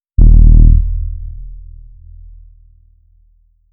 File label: low bass boom with a; atmospheric